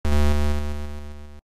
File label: jingle intro start